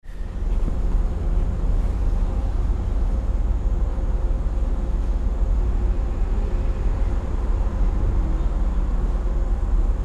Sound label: Suburban ambience train station